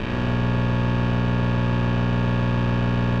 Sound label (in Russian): mehanicheskij gul kak u dvigatelya motora ili chego to eshe